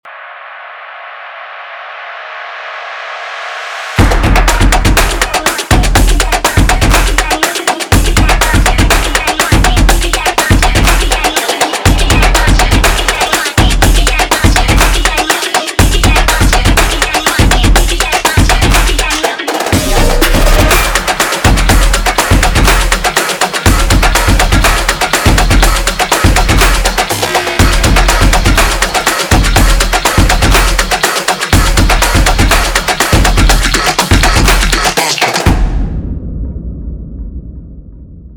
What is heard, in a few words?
tribal drums